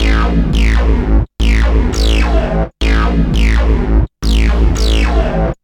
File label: Warp Bass; Sonic Foundry; fluid